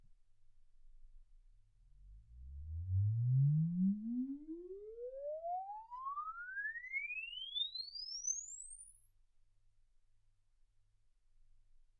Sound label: exp swept sinus 10Hz 24kHz